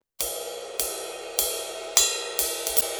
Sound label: sabian 20 hh raw dry ride